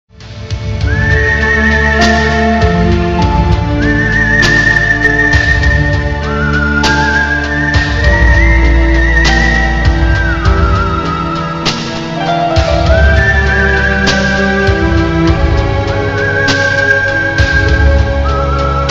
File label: Mobile ringtone